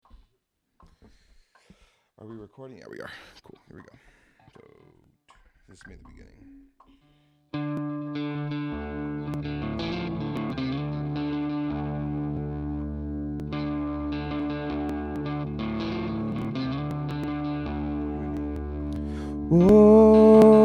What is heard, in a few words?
bells